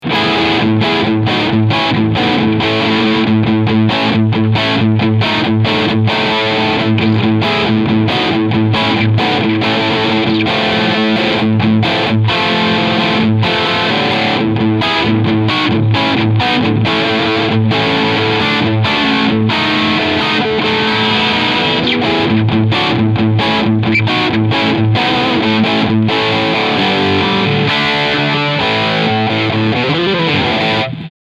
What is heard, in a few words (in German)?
Maybach Stradovari Riffsound Steg Pickup